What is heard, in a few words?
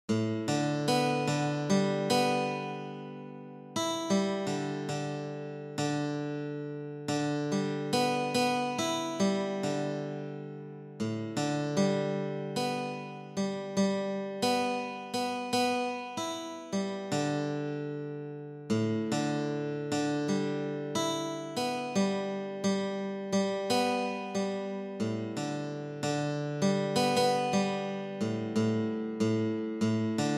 relaxing guitar